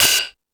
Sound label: Fusion Stix, E-MU Pro-Cussion